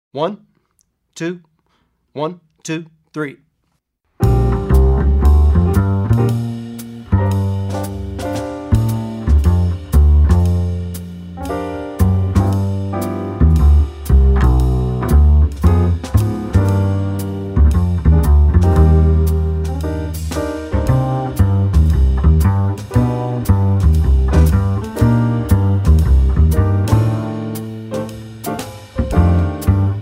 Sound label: Jazz Bass with bass soloist; Effective Etudes For Jazz Bass